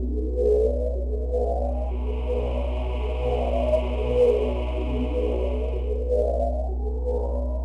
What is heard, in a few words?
soul hum; Shadowman